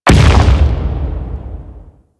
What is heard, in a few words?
Rock Elemental Land; Warframe; Brawler